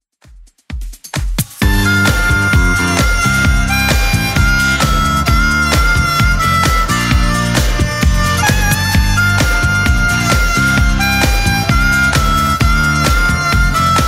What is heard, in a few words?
80s Club Edit 131bpm